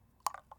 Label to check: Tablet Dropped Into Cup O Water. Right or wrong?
Right